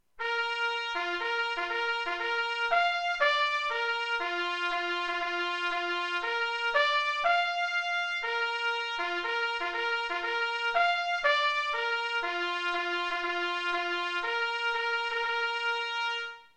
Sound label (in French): extinction des feux clairon